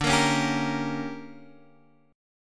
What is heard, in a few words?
D Major 7th Suspended 4th Sharp 5th Guitar Standard, chord; strummed